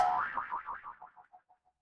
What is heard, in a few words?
cartoon boing